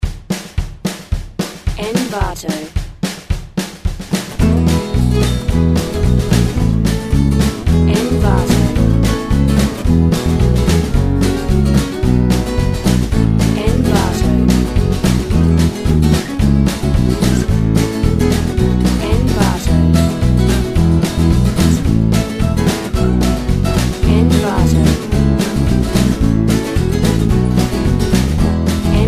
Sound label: bluegrass groove